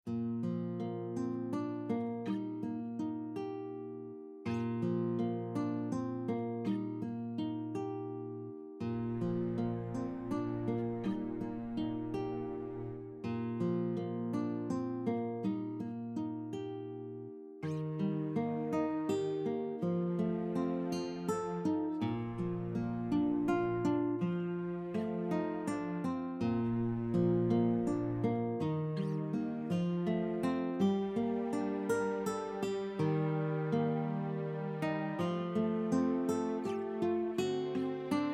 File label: backing track